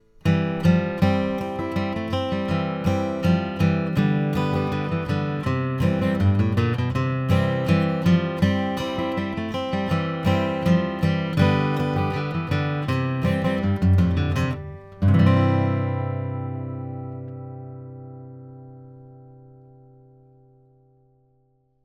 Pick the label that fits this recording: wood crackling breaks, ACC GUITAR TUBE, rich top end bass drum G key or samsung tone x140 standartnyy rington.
ACC GUITAR TUBE